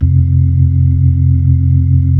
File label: B-3